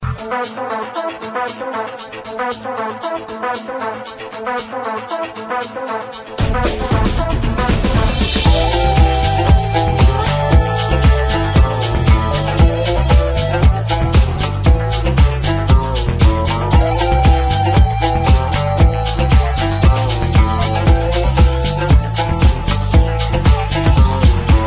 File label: bass and flute ringtone